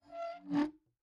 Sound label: Door Close; openclonk